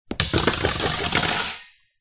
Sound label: impact metal cage small drop on wooden surface